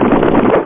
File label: CATAPULT; PowerMonger